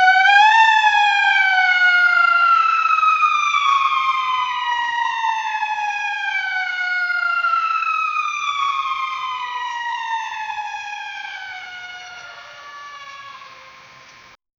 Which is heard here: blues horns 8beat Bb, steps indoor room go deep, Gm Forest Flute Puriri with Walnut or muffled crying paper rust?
muffled crying paper rust